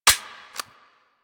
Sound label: Revolver Pistol Mech